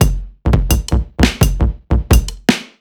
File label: Kit Wonk, 85bpm; off the grid